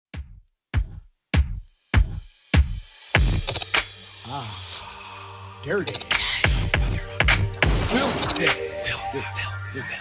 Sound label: 2ks Redrum, 100 bpm